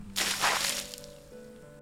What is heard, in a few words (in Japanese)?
SE 水 撒く